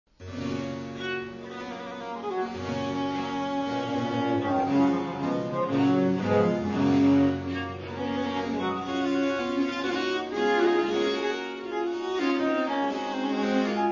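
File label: G major prelude; Marais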